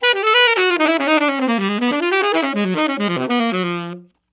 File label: CRAZY DOWN, TENOR SAX